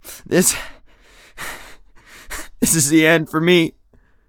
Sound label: hurt near death; Escape from Tarkov; usec2